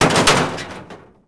rollup hit

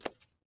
fs concrete, footsteps

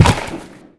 Ball Hit Net

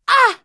hurt; True Crime Streets Of LA; XBOX

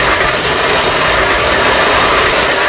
slide pipe, terrains; Tony Hawk's Project 8; XBOX

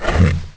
roar

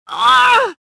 pain; tavion

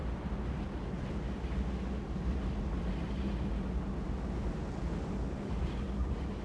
wind, ambience; Star Wars Obi Wan; XBOX; coruscant

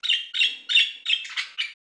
bird; Virtual Pool Tournament Edition

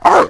dog bark

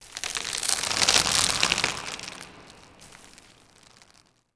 Vine Drop; Myst III Exile

